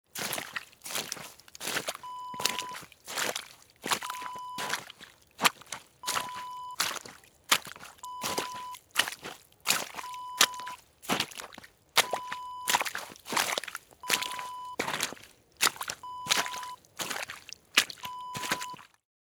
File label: footsteps wet snow